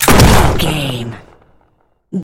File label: gun shotgun shot